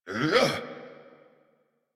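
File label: Ability Exert; Dialog; en; Warframe; Veilbreaker; Kahl Barks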